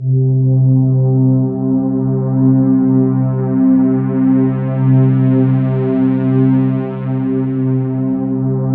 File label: C3, Synth Leads